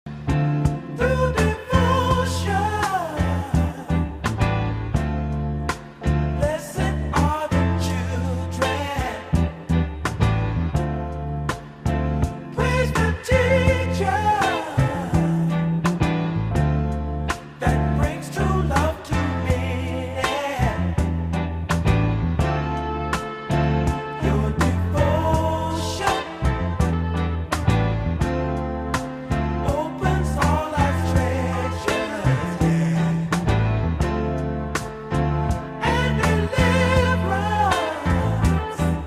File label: soul inspirational devotional